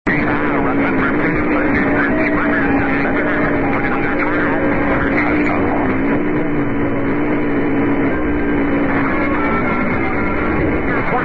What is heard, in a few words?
Radio Sweden Interval signal